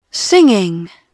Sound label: singing; Dorling Kindersley My First Incredible Amazing Dictionary